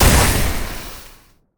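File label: pillar red explosion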